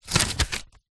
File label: household book very small page turn; zapsplat